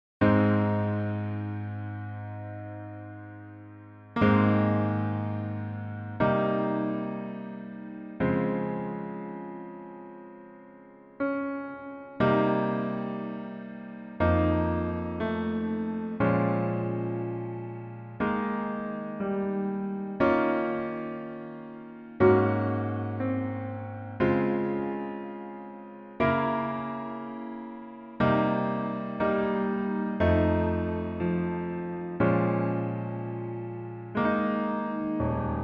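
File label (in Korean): Piano version 여자키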